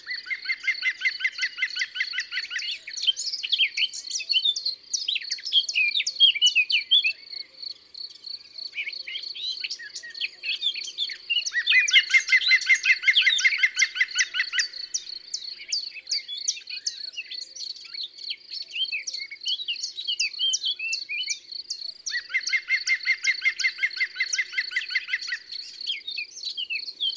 Pic vert; abc de la nature

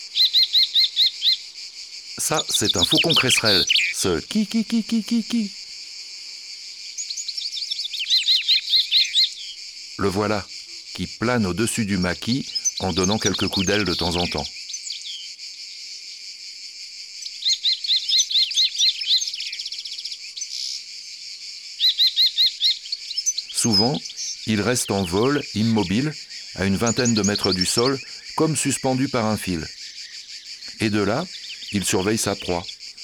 Faucon crecerelle; abc de la nature

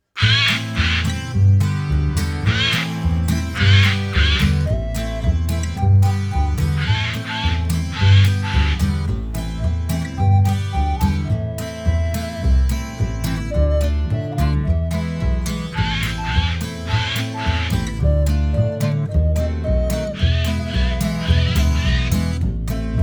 instru; cuicui et compagnie

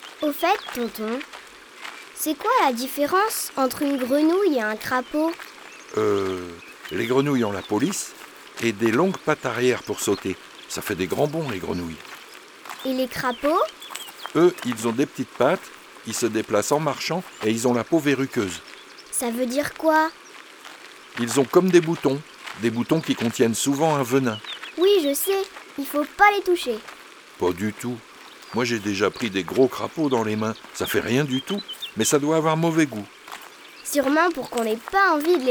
Une balade au bord de l'eau L'alyte; Studio les Trois Becs

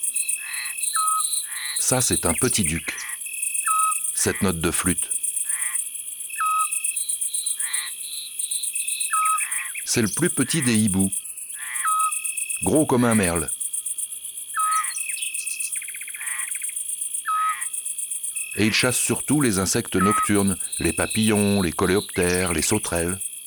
Hibou petit duc; ABC de la nature

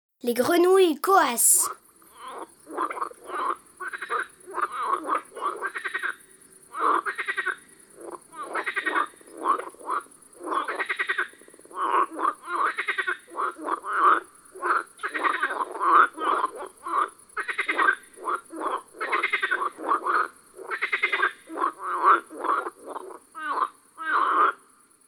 Les grenouilles; mon imagier sonore de la nature

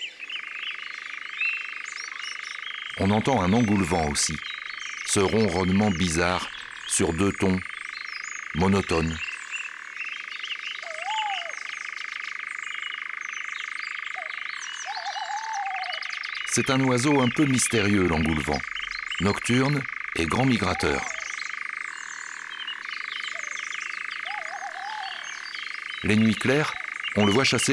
Engoulevent d Europe; ABC de la nature

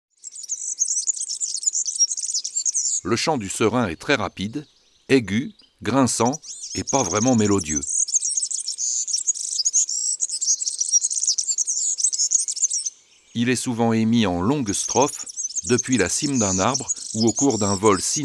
Serin cini; oiseaux des jardins

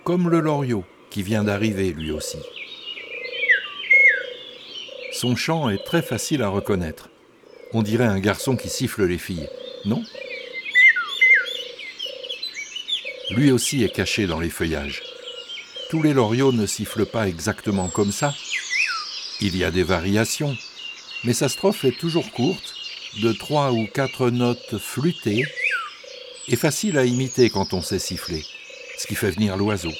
Mai Le Loriot d'europe; chants d'oiseaux

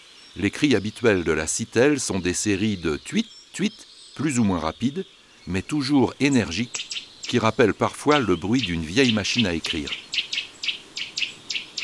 Sitelle torchepot; oiseaux des jardins

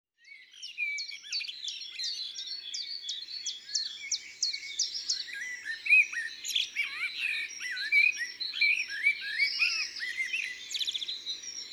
Merle noir, Chants d oiseaux des bois et des forets

